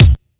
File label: old school clicky kick drum single hit d key